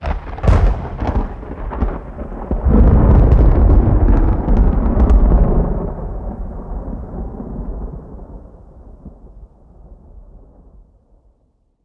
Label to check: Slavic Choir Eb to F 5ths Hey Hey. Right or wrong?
Wrong